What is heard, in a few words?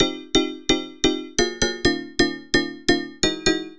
instrument layer; lead arp chord melody